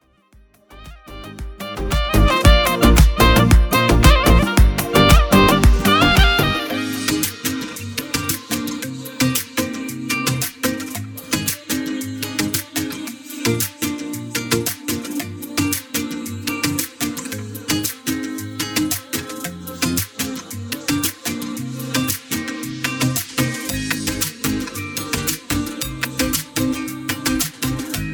novocal

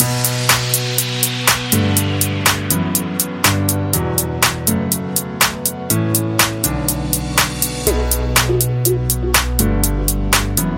deeper mainroom, synth; SM155 Deeper Mainroom Inspriation Kits

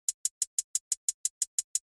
hihat trap; trap fl

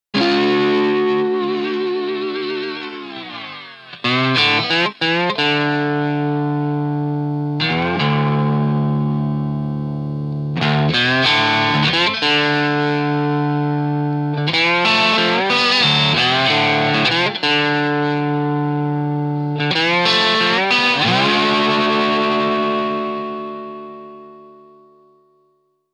no eq on slide; fab q2

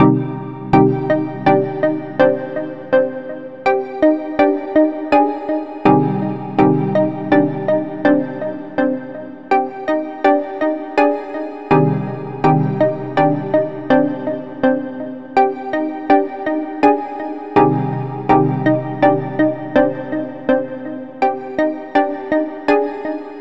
Loop 82bpm Synth Pluck Cm

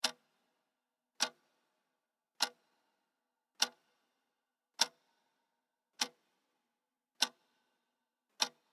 Ticking Clock

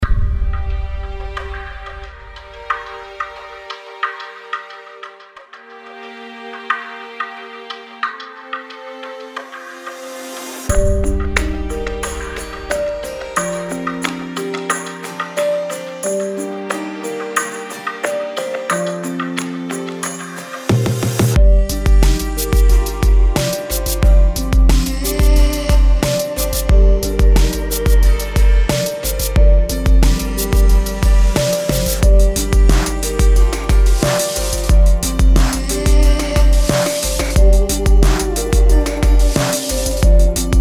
mega drums

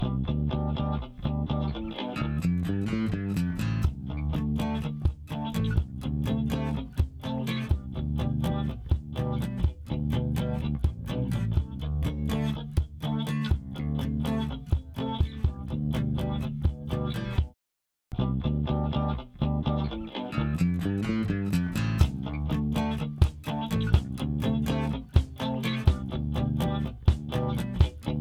Multiband; multiband compressor

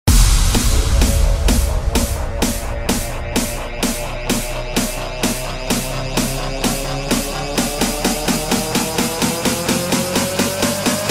FX House Sample

